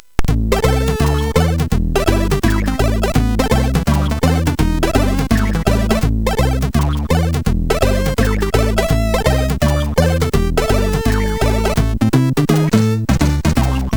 Weird Jazz, MOS6581R4

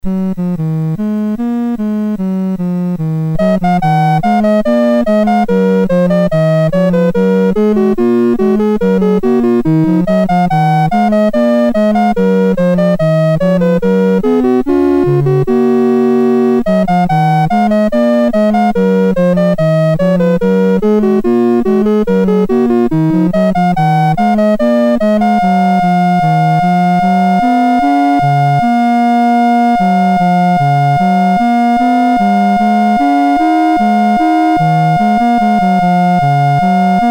Bourree, MOS6581; MOS6581R3

